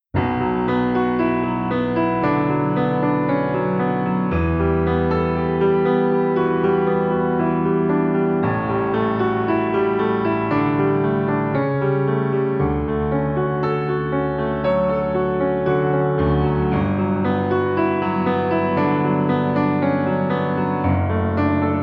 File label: Piano